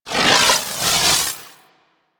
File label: Crewship Missile Swarm Proj By; Vehicles; Crew Ships; Weapons; Warframe; Rail Jack; Corpus Crew Ship